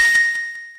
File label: ding; chaturbate